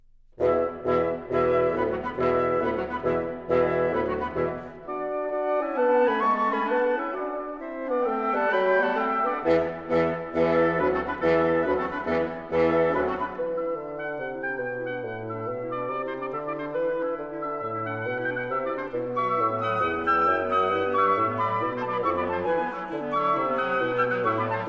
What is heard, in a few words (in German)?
Kleine Kammermusik für fünf Bläser